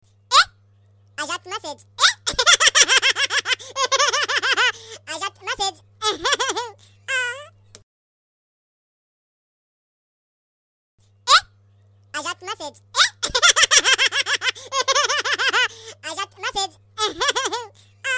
sms ringtone

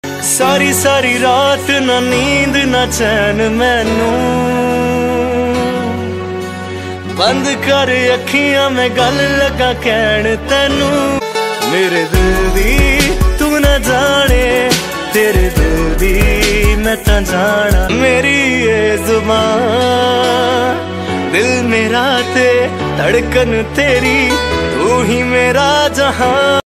bollywood ringtone

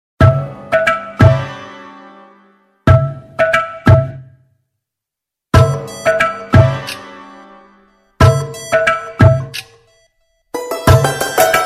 instrumental ringtone

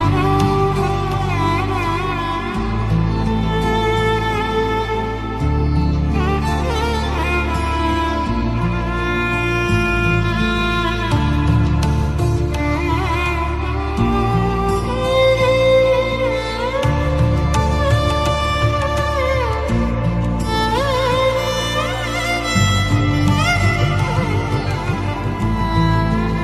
tuerkish sad melodiler ringtone

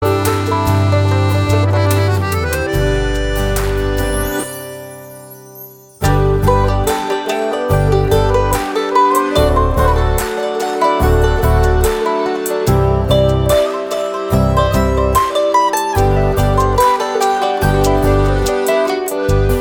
unplugged version

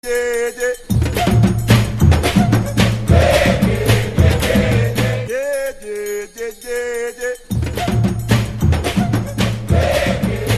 africa deep ringtone

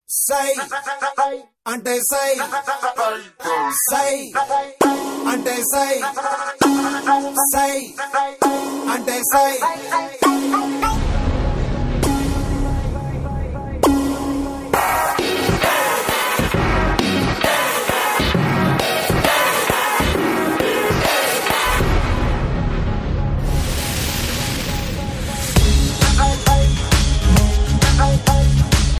telugu tone ringtone

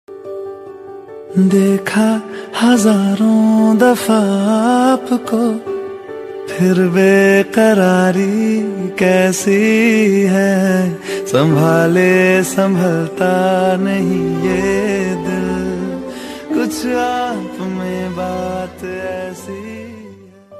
sad ringtone

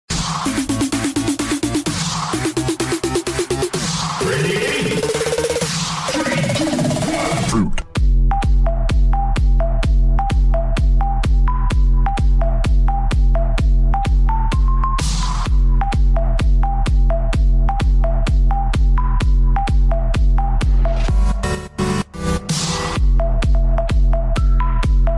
ready 3 2 1 drop ringtone

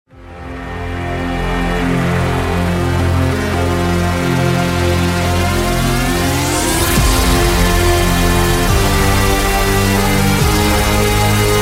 remix ringtone

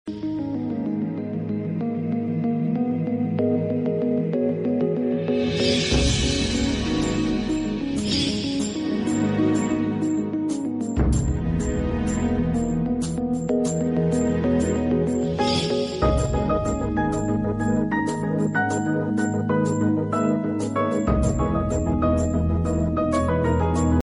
instrumental ringtone

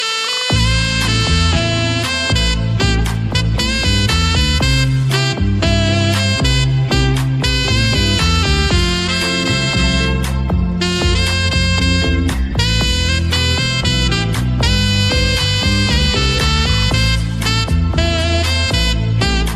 saxophone ringtone

